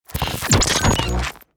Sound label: Glitch transition whoosh cinematic short